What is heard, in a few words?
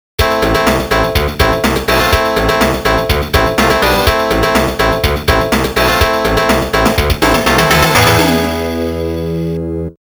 Continue; Toaplan Arcade Sound Digital Collection Vol.02